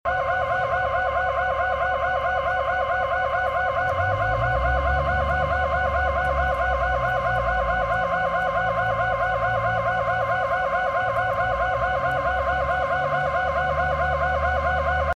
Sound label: lockdown external audible alarm